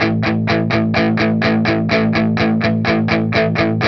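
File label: RHYTHM 125, Vintage Blues Guitar; USB Soundscan vol.22; AKAI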